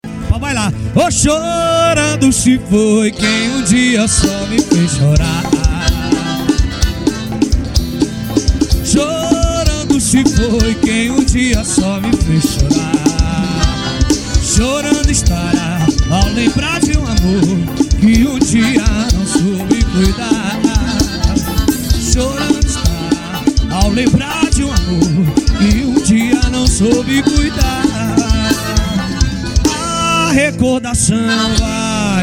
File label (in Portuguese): banda ao vivo; em taua